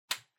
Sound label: Power Strip Hand Gentle Unplug